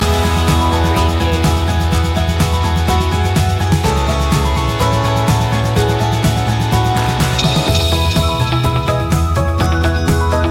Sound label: the skys the limit no vocals